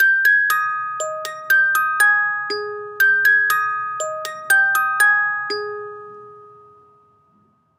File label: Music Box; Assassin's Creed Syndicate